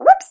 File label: game incorrect